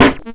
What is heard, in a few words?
grind, EMU XL-7